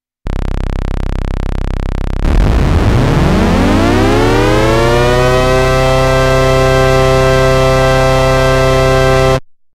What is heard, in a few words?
blit saw